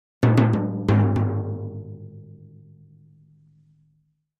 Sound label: Drums Toms B Accent